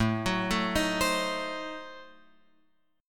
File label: A Diminished 7th, Guitar Open F; x,4,6,4,6,7; arpeggiated; JGuitar